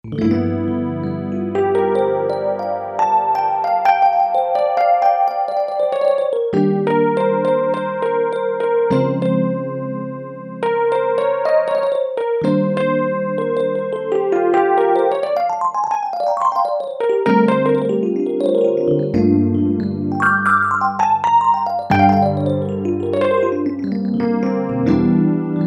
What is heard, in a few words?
E. Piano, Roland U-220; Factory Patches